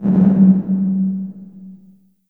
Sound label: LH LC808, REVRB LARGE HALL